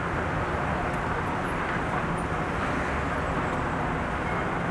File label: s town; Mafie 1